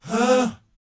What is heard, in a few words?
BOYZ, Vocal Planet, R&B Dance; Spectrasonics Vocal Planet